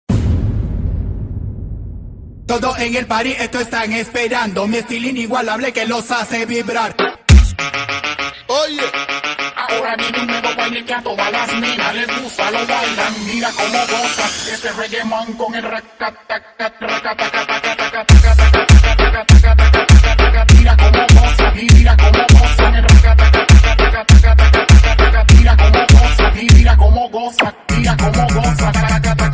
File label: azeri bass music